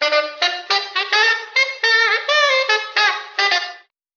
sax; Phonky

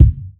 Kicks

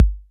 Kicks Medium

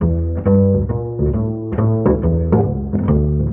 bass, Loops

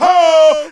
"ho", voice; ooh ahh

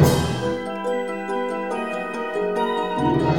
strings, sad loop; Loops

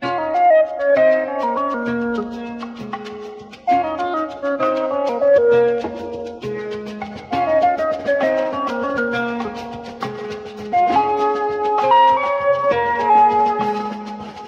keys, Phonky loops

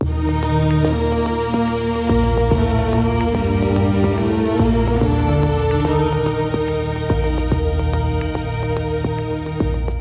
strings, Sad Loops

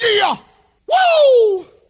eeyah woo, voice; ooh ahh

